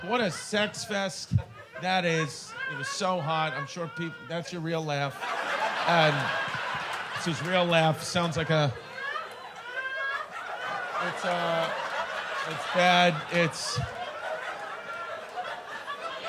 annoying laugh

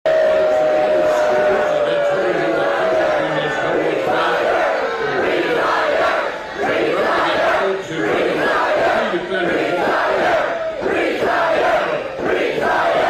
crowd; MITCH McCONNELL

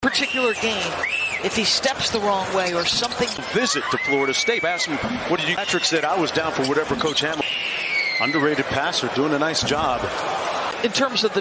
screaming; BULLS RAPTORS

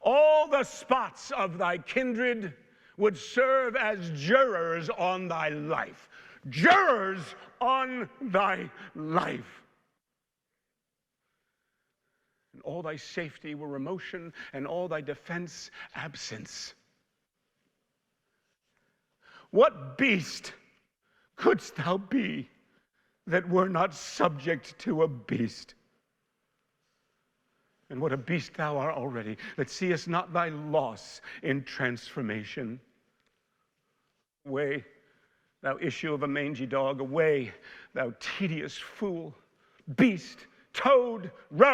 MONOLOGUE OVATION; KEVIN SPACEY

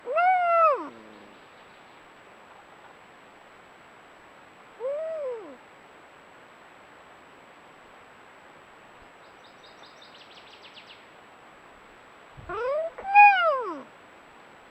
HOWL OF A TIME

